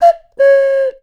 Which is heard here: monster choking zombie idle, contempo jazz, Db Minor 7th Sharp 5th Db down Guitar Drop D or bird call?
bird call